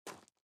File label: SFX run gravel; Out of Reach: Treasure Royale